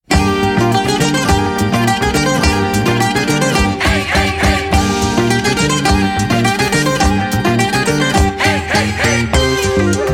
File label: Greek tune